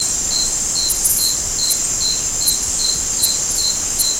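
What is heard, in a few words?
Crickets Night Ambience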